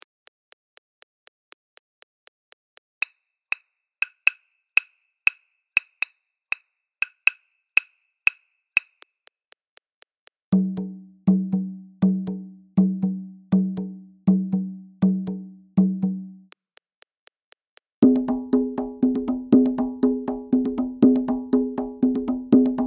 Agbaja 120bpm; Afrika

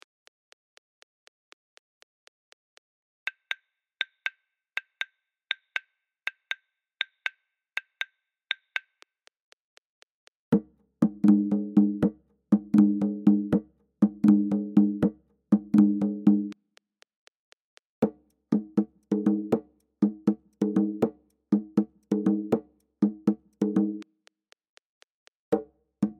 Sobonincun 120bpm; Afrika

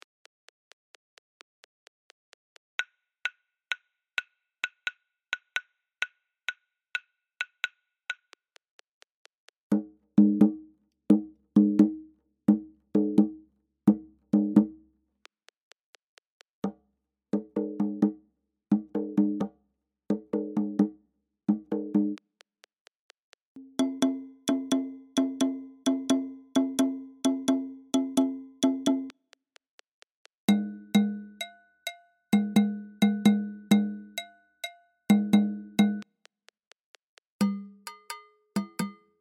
Soli 130bpm; Afrika

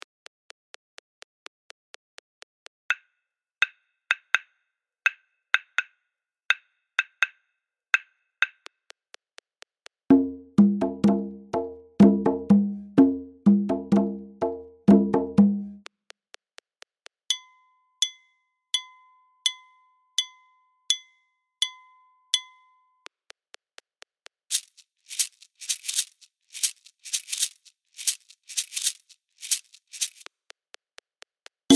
Ekang 125bpm; Afrika

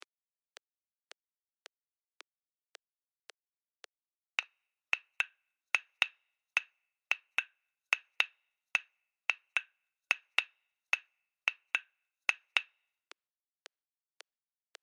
Fankani 110; Afrika

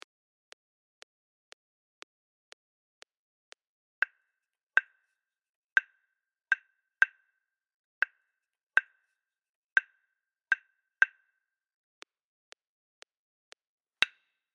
Rumba Yambu 120bpm; Lateinamerika

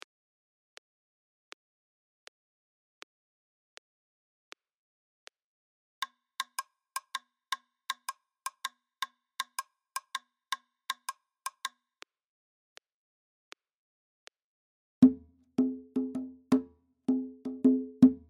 Sica 80bpm; Lateinamerika

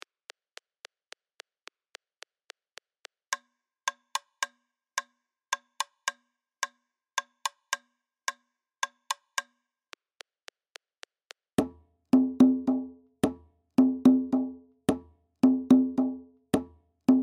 Yuba 109bpm; Lateinamerika

